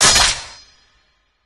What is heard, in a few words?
Sword